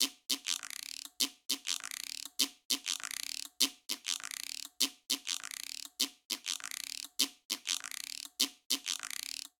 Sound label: Plena Guiro 100bpm, Latin Percussion; Ableton; Factory Packs